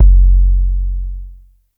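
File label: KICK, DRUM KITS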